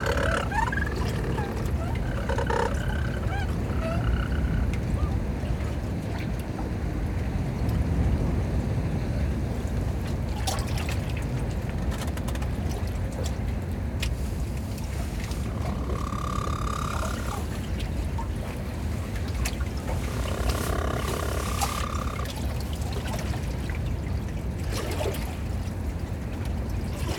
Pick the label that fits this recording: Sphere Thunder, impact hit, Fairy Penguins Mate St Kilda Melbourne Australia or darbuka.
Fairy Penguins Mate St Kilda Melbourne Australia